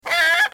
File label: bird warning; crossyroad; dinosaurs